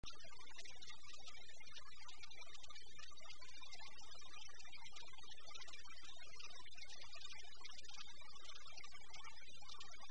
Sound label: ambient